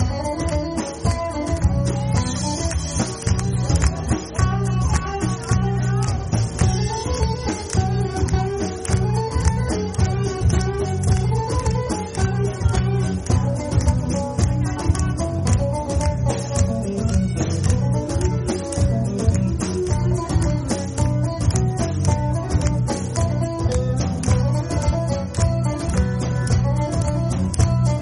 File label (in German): Tuerkische Volksmusik